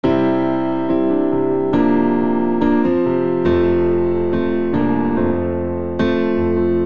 Progression Rock V - IV - I F Major 70 bpm, Grand Piano; MIDI Clips; Ableton; Factory Packs